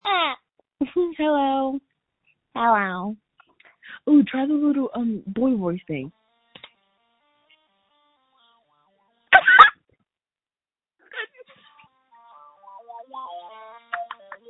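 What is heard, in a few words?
screams that feature laughter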